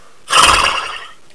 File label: horse